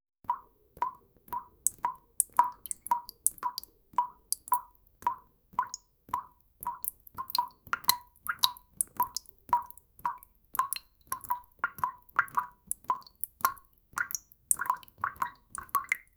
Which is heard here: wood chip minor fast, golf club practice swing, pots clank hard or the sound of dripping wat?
the sound of dripping wat